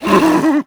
grunt, zombie; creatures

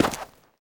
sand, footsteps; player

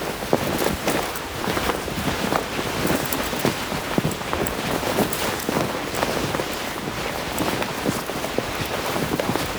body loop, foley

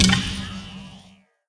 step run, footsteps, player, lightbridge

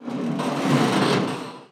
cable stress; ambient; dust2

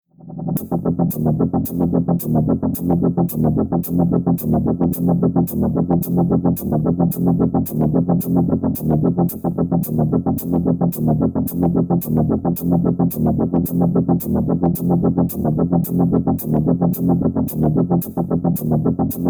start round, music; hotlinemiami

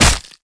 claw, player damage

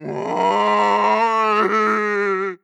idle moan dry, zombie vox; creatures

